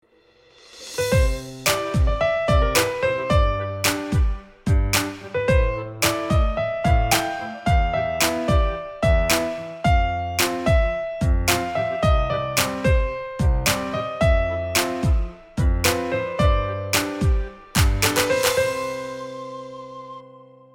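light cheerful unhurried variety opening transition short sound effect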